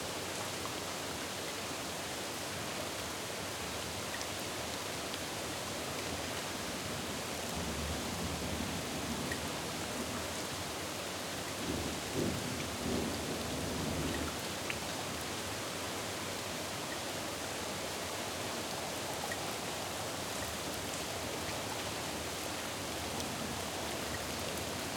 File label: Rain, City, Medium, Drippy, Distant Rumble, AC Hum; EMPTY CITY RAIN; PMSFX